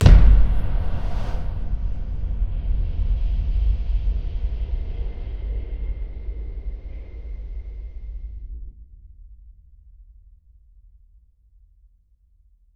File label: Distant Bomb; SFX